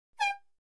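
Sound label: Football Air Horn Blast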